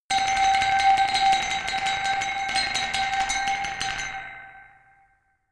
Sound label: glockenspiel dark tremolo high